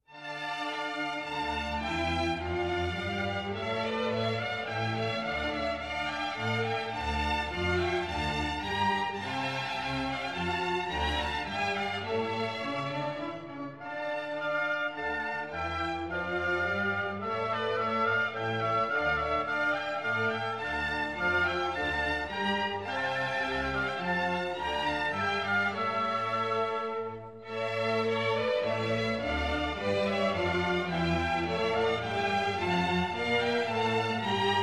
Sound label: Music For Firework Menuett; Haendel; Wassermusik & Feuerwerksmusik